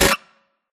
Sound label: Screen Glitch Short; Boomtown; Titanfall 2